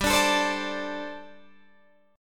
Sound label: G Suspended 2nd Suspended 4th, Guitar Open D